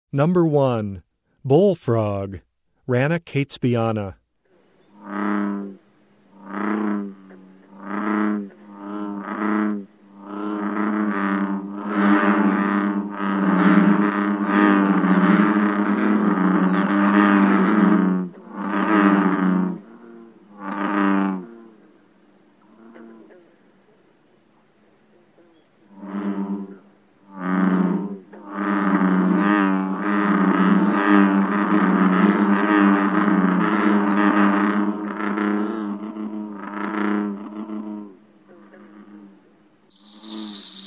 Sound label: Rana catesbeiana - Bullfrog, Frog Calls; Herpetology; Bio227 - Herpetology